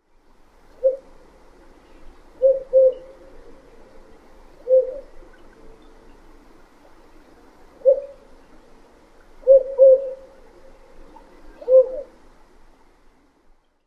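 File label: song, Spotted Owl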